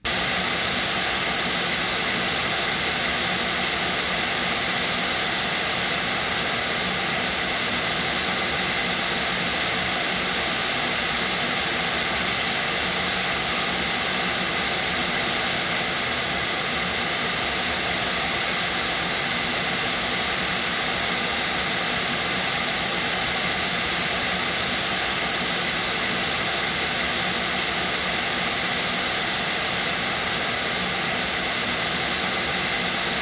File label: Noise